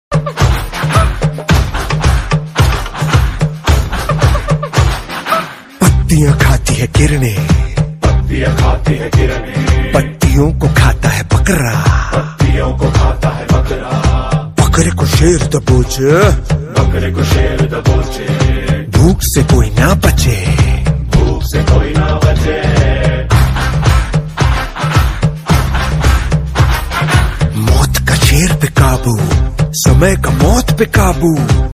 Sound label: hindi ringtone; pushpa movie